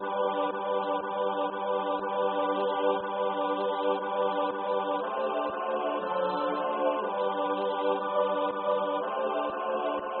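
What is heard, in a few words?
Great Litany; Velikaya ekteniya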